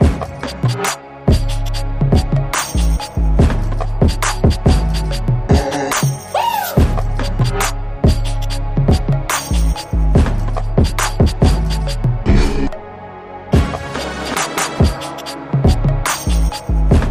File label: Stop Me, No Dropsynth